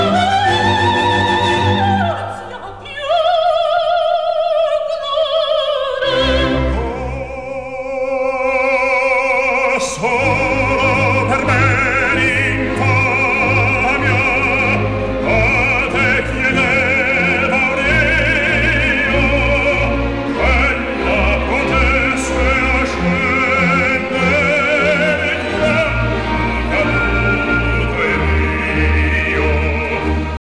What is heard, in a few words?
classical